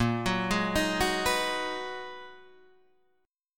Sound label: Ab Minor 7th Bb chord; Abm7/Bb; arpeggiated; JGuitar